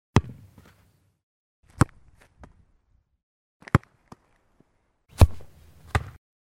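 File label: series of powerful hits; Звуки футбола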